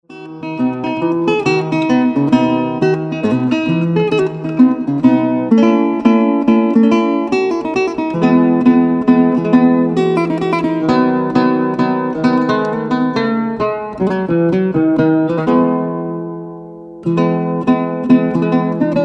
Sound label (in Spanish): MINUET EN FA MAYOR